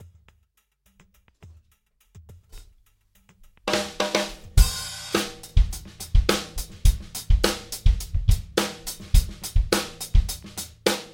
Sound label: Funk 105 BPM